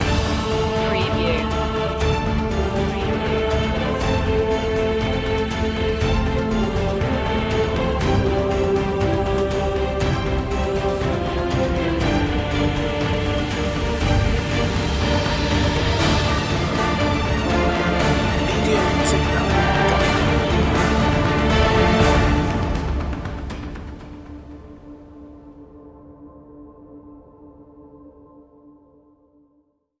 with choir

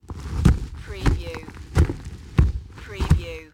CREATURE HEAVY FOOTSTEPS

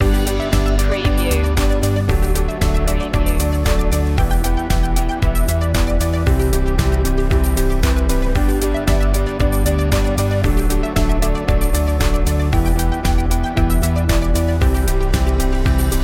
easy corporate loop

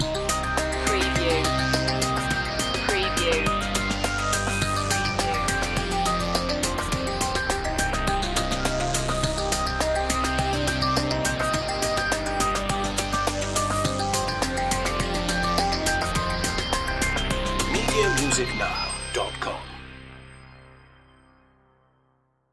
ambient breaks loop end

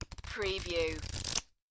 Cards Playing Cards Flipping Through

